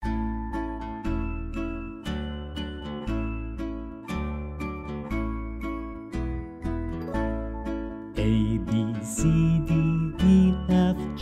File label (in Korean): 영국남 동요 알파벳송